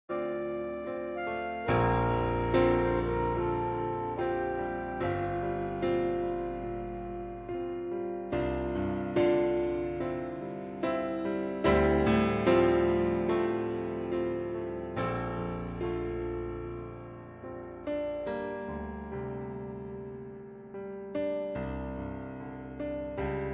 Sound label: piano improvisation; Spy vs Spy